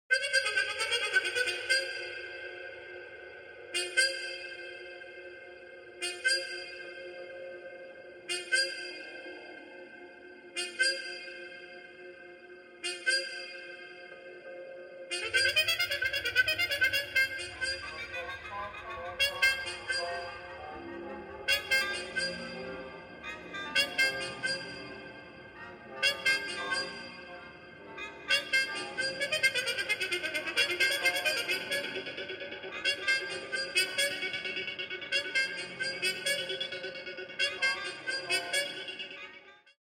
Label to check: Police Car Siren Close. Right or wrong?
Wrong